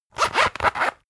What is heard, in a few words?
zipper long close rapid; objekte; reissverschluss